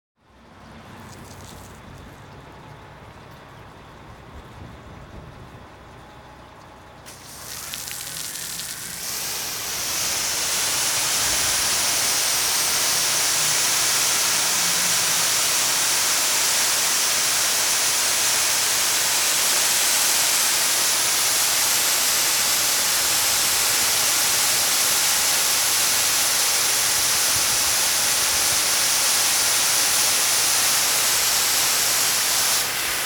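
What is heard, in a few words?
fire hose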